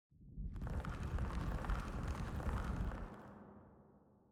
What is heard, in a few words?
Vines Deep Forest Big Retract